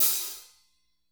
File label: hat, drum